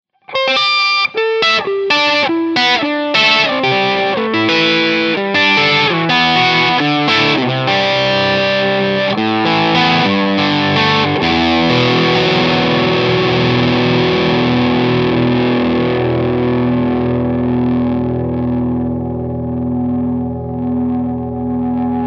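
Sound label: Modern Dist ES335, guitar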